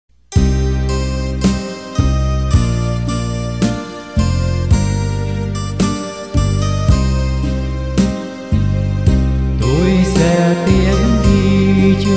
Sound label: BASS